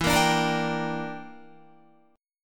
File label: E Minor 7th Flat 5th, Guitar; Em7b5; strummed; 12,13,12,15,14,14